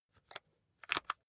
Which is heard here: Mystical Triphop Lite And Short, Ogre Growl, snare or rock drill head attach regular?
rock drill head attach regular